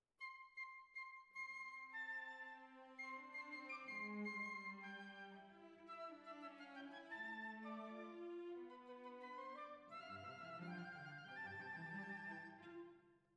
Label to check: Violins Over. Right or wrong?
Right